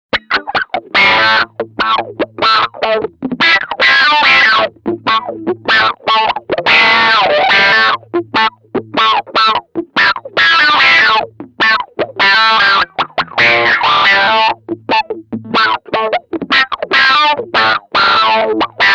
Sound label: Bridge Pickup BP